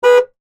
Chevy Impala, Transportation, Automobile, Chevy, Impala, Horn, Honk, Single, Short; Car